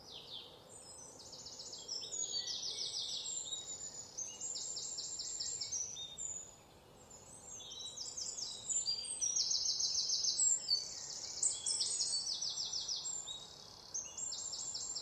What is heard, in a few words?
Jungle Sounds